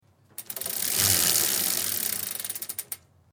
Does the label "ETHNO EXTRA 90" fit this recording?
No